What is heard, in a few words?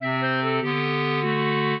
trio, clarinet; Mozart